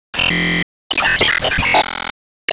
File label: Powerball Hit